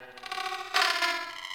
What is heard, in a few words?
Beep Stutter; Warframe; Corpus